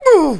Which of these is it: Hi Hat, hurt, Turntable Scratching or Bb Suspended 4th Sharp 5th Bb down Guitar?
hurt